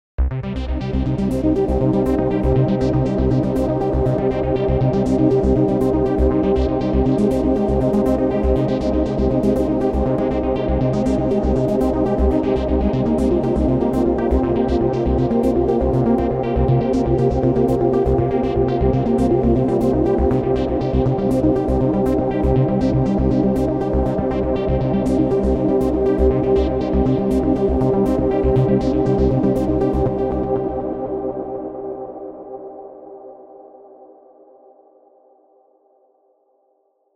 Shimmer Tron Arp

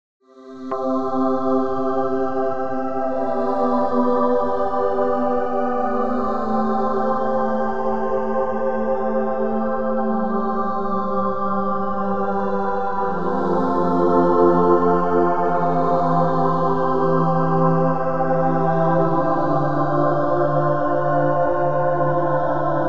Vocal Morph Pad

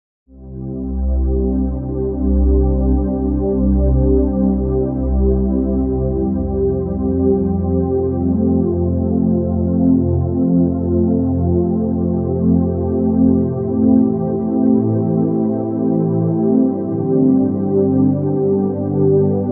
Warm Pad

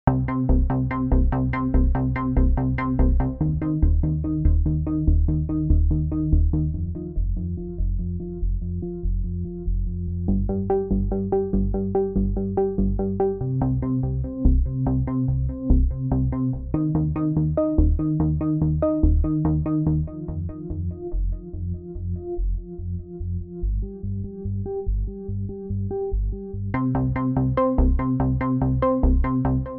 Dark Tron Bass Arp

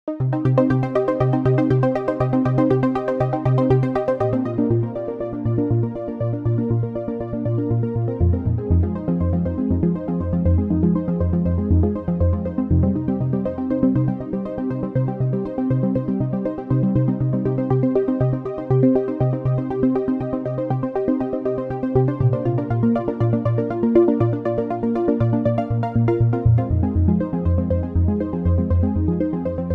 Micro Pulse Arp

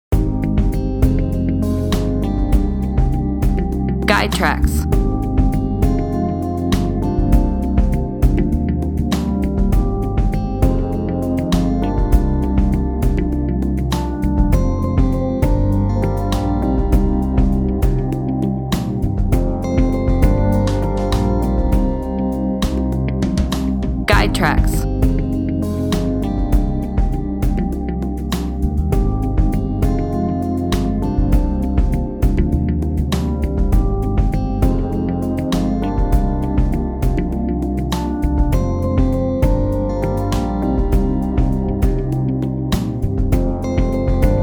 Sippin Jazz

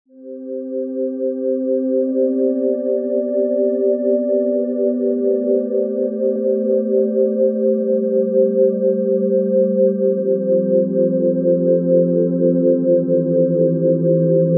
Floating Pad

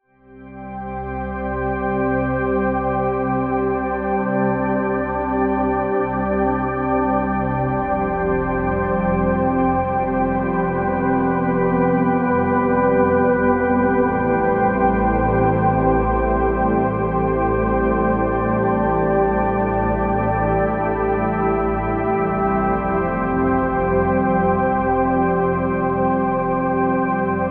Cathedral Pad

